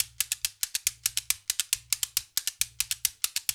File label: Gyana HiLife, African Contemporary; Spectrasonics; Supreme Beats